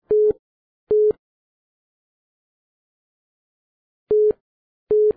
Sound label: call waiting; Samsung SGH-i620